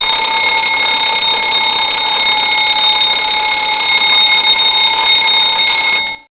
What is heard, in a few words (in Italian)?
Campanello sveglia clock